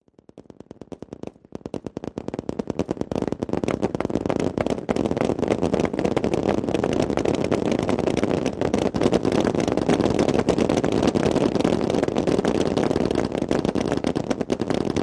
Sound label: Rifles Firing; modern